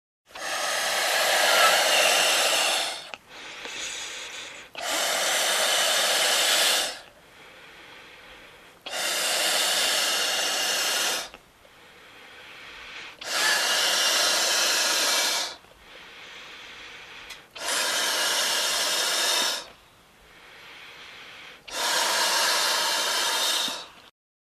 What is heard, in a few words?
Inflating a balloon